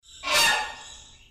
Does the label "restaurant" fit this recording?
No